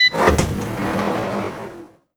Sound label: Open Sound; Mars Sortie